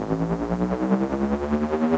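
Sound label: railcanon charge start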